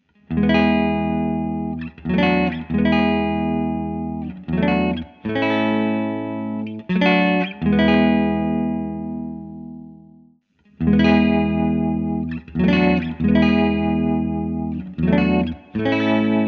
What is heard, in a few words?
Guitar Phrase Tremolo